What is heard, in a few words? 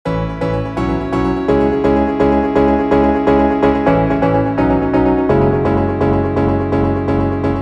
keys house piano